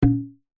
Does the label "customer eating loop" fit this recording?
No